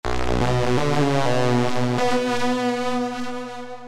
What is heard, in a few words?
Synth